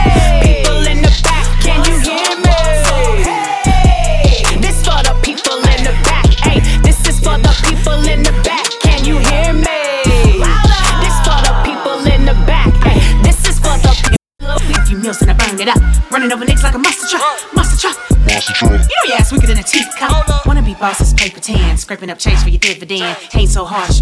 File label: comedy film samples; GRIND FORCE RECORDS